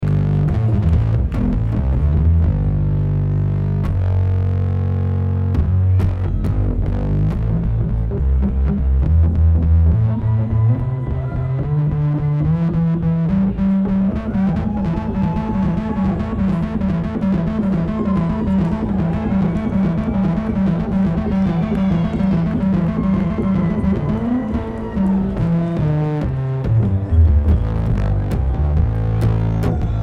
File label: Traffic Jam